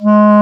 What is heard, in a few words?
WND CLARI, Clarinets, Clar Bryt